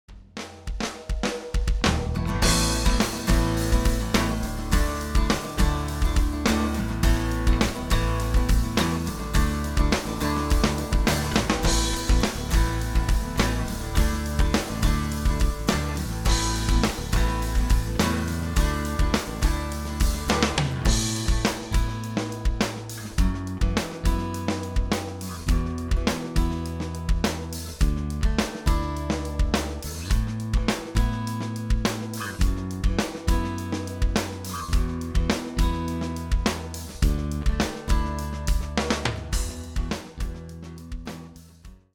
delay out in band, Same thing, but with the rhythm section; Mixing for God audio examples